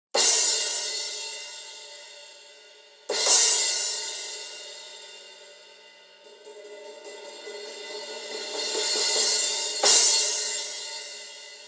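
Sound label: Resonant Crash 16 Rock, cymbal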